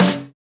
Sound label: snare samples, Rock